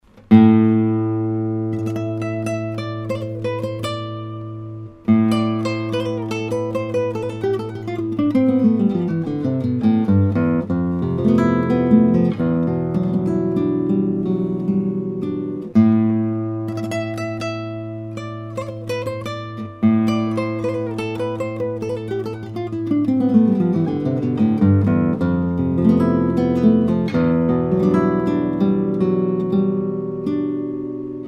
humphrey millennium concert classical, nylon string guitars